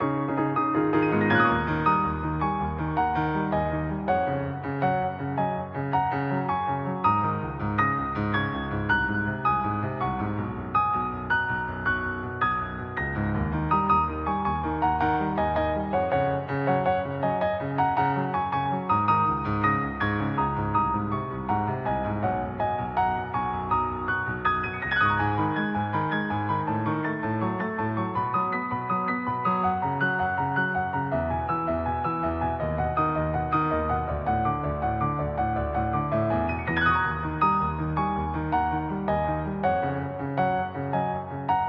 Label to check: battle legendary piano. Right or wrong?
Right